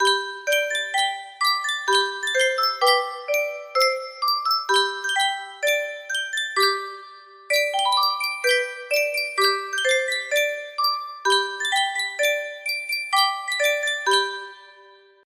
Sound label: Sankyo music box, melody